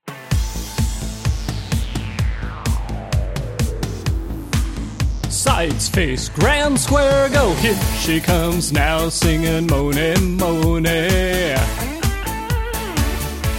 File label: vocal